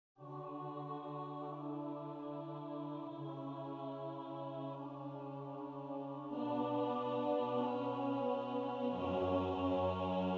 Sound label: Sustain